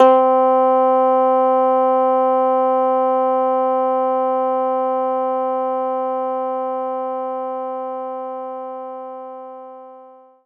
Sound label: Finger Bass A0; knights try